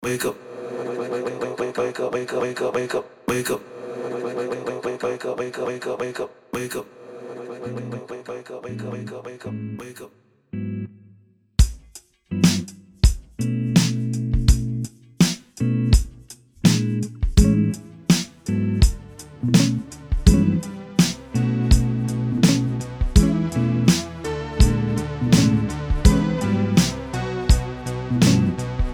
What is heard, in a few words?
Ambiance RnB